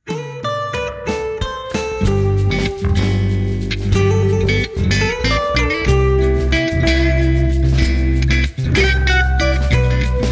Doubled Jazz

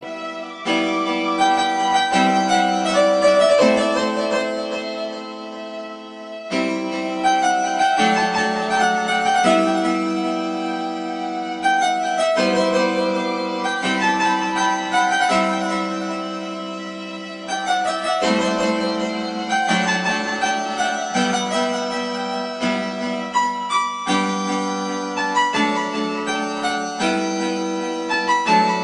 music, ringtones; blues

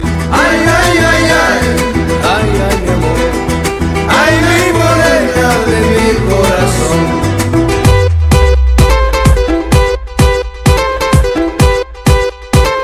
el mariachi techno

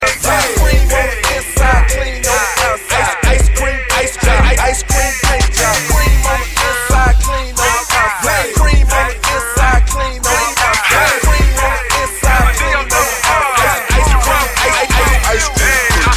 Hip Hop Ice Cream

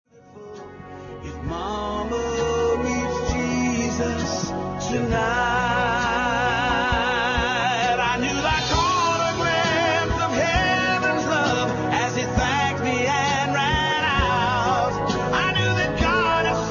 awwwwwwwwwwww

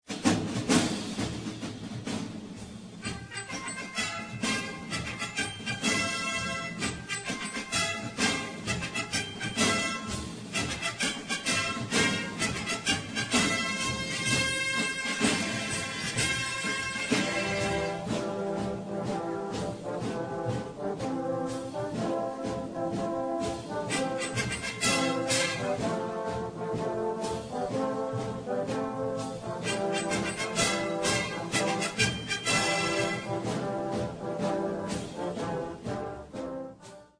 orchestral

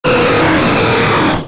Roar; Rayquaza

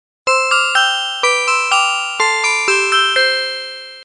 sweet tune

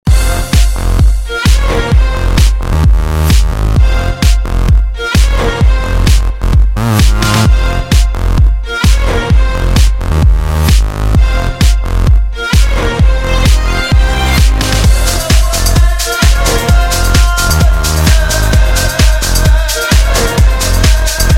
arabian house, ringtones electronic

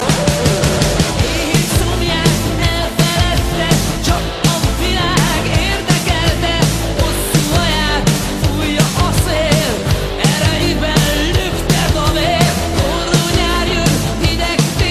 rock, ringtones; blues

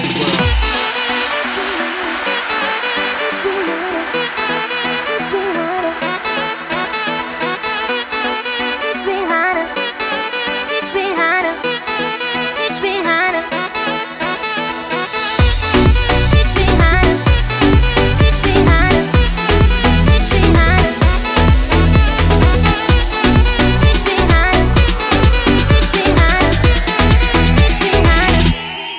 SAX

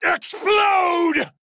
explode; Krieg

